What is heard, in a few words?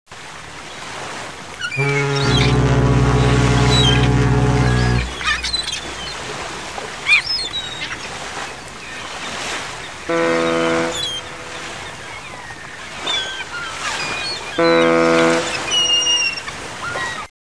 Harbour